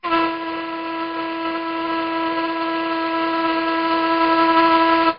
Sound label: Horn Blast